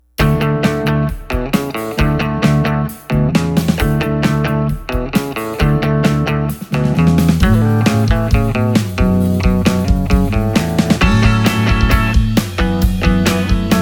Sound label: guitar; guitar friend